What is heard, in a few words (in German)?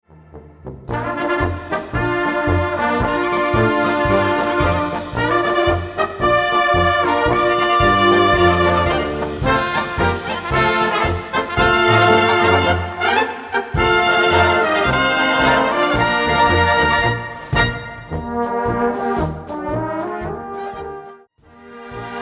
Polka